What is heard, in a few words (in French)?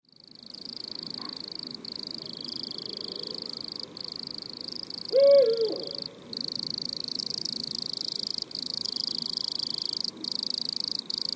insectes nocturnes